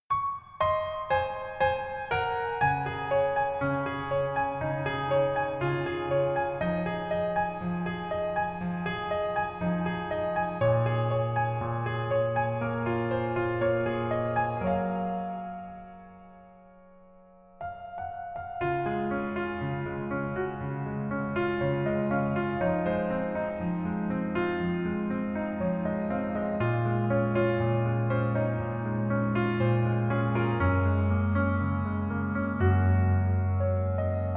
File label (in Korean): Piano ver, 멜로디MR, 여자키; 더킹, 영원의 군주